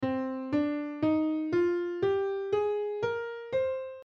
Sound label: c natural minor scale aeolian